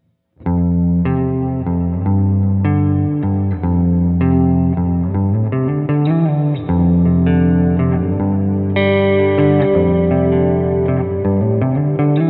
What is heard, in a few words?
room, big sky; strymon big sky; Lingering Thoughts; Reverb